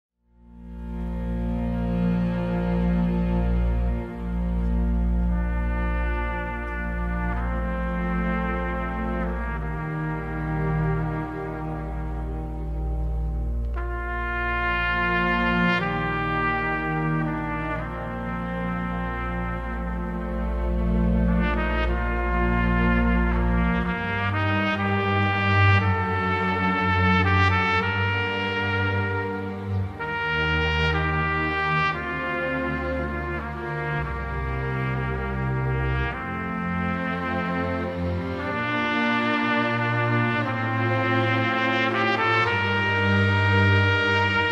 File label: Trumpet